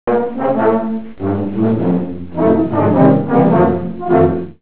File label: tuba